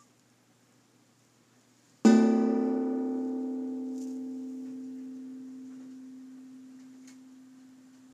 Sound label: gong